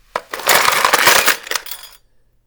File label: mechanism box of old reloads; mechanism source; soundmorph